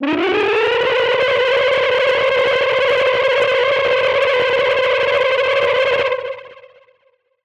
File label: bike